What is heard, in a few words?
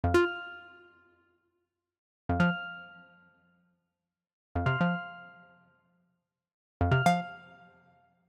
Arcade Click Positive; boom library; The Interface